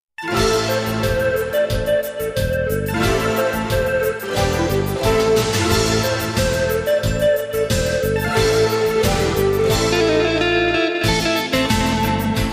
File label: Romantic Guitar